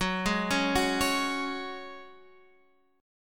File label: Gb Suspended 2nd; arpeggiated